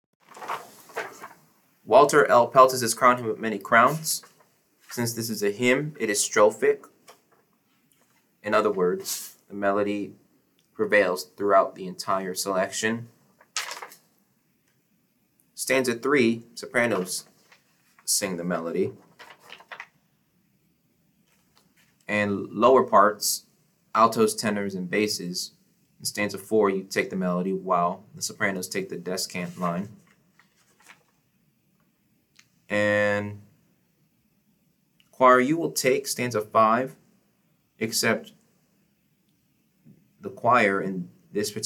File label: Sopranos; Pelz-Crown Him with Many Crowns; Stanza 3, Stanzas 1-5; Chamber Choir